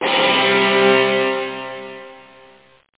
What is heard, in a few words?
heavy guitar; OctaMED SoundStudio V1; jazzies samples